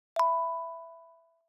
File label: Navigation Generic Maneuver Salient Haptic; i OS; iOS10; nano